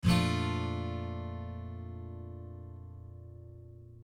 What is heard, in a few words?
B♭ Maj